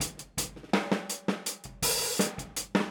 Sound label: Boogaloo, Hi-Hat, 82 BPM; Drums; Verse; Sonic Foundry; Drums from the Big Room The Mixes